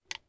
crossbow start, weapons

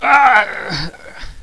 alpha pain; paranoia

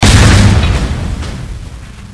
door

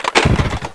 chainsaw off

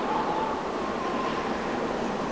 wind

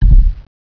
body hit

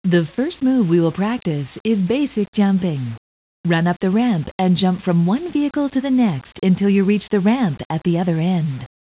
3 jumps

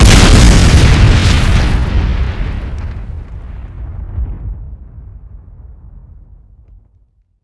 explode

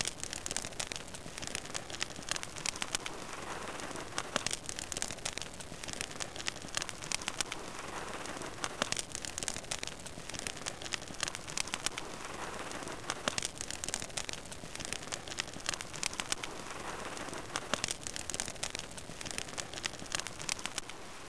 fire, weapons

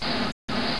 idle switch, weapons

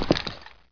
movement

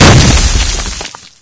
explode; rollermine

